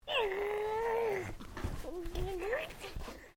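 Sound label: MALE 2 year old TICKLISH; Our friends 2 year old son; Talking (Sound Effects:Voice)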